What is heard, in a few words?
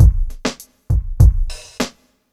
drloop, drumloops, Vinyl Attack; Masterbits